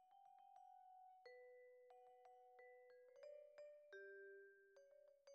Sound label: Vibra Close